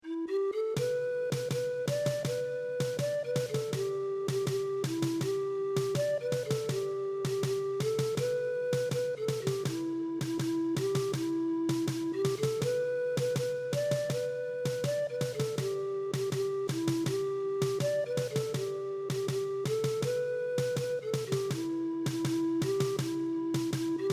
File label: PERC; Navidadau